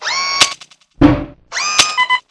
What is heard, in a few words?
rocketlauncher reload, weapons; cstrike